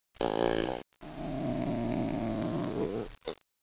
lizard, animals; ambience; cstrike; sadpuppy

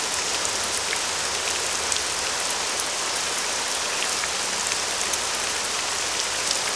rain

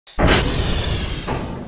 Futuristic door; cstrike

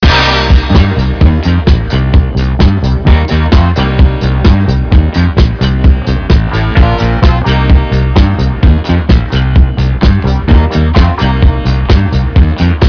disco; cstrike

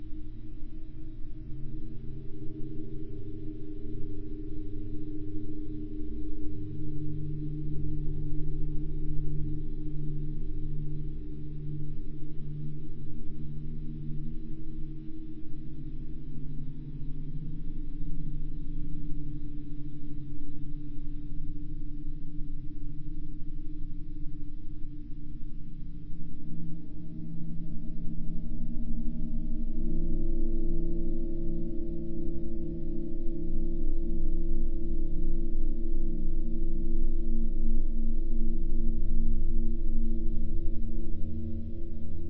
ambiance; cstrike; hypercube

